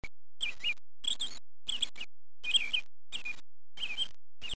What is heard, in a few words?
robin